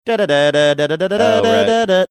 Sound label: singing opening